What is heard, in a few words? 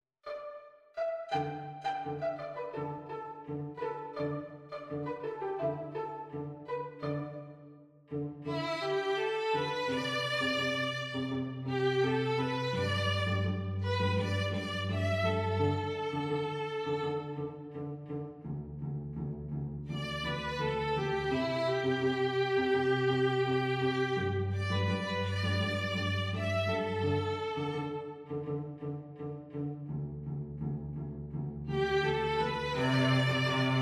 STRING DUO